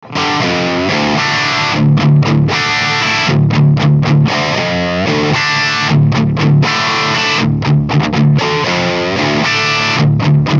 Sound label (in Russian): Запись с выхода спикосима; Микрооконечник для игры в линию версия 2